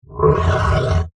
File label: Monster Vocal, Large, Creature; Horror; Element